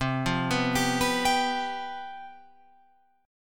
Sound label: C Major 7th Suspended 4th C down Guitar Open F; arpeggiated; CM7sus4